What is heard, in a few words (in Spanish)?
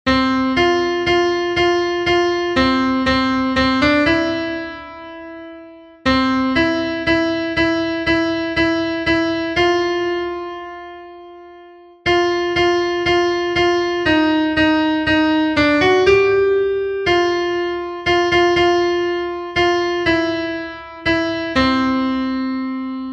CONTRALTO, himnos en piano; cantos del camino